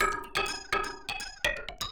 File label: PERC LOOP INDST 125, Industrial Loop